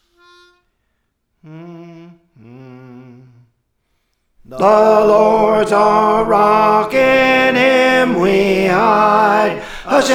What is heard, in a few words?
Tenor, Praise For The Lord Training